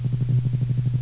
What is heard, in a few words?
HUM, 机器